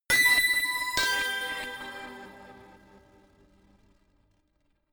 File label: notification glockenspiel crazy third down; instrumente